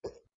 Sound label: Gag; Lewd SFX